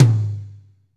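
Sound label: tom, Pop Country; Northstar - Drumscapes Roland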